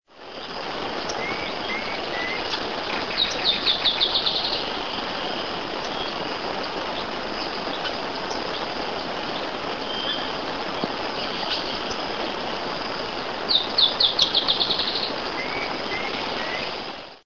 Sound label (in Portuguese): Drymophila malura Choquinha carijó